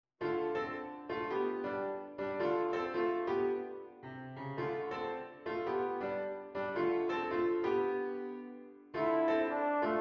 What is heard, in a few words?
Beautiful Wander Trombone; bass clef or bb treble clef